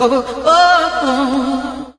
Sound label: "ohoh oh ho ho" sung; ooh ahh